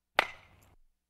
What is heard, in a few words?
Baseball Bat Hitting Baseball